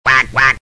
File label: Quacking duck; Voice Cloak Plus 1.0.43.0; Acousticons